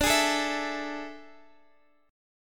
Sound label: A Major 7th Suspended 4th D